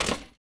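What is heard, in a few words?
footstep Stone; Star Wars Obi Wan; XBOX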